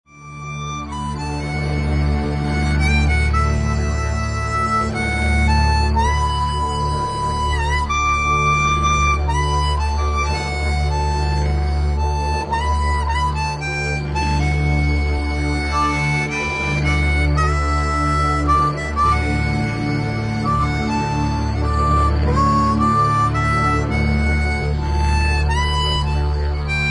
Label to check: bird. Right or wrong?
Wrong